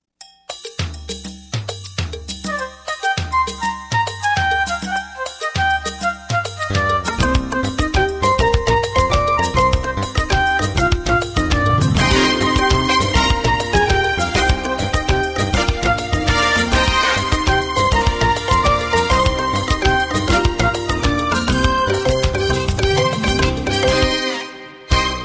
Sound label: Saxophone